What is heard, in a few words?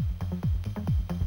Vicious SID 2-15638Hz, MOS6581